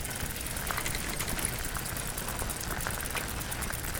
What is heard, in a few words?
bicycle gallop